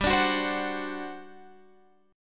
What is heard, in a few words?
G Major 7th Suspended 4th, Guitar, Open F; GM7sus4 strummed